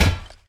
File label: Sword Hit Wall; unsighted